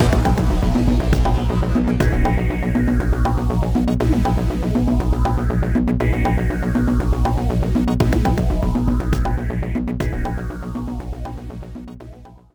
Jingle Lose; the next penelope

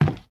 Wood soft; Devour 2021 gamerip pc macos

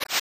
Fire

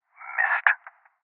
Sniper Miss; This Is the Police 2

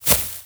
warrior walk grass

